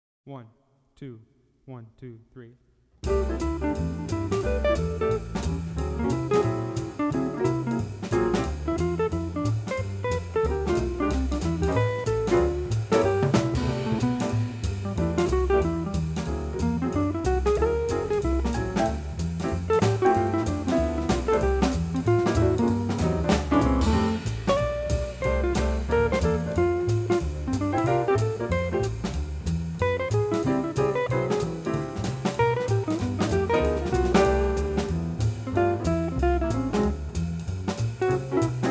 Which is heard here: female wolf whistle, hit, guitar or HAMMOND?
guitar